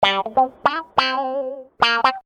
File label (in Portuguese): Toque Claro; Samsung